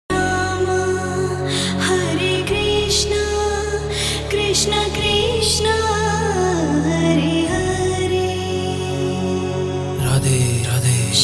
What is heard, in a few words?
Hare Krishna Hare Rama Female Ringtone